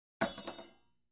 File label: Free Weights Exerci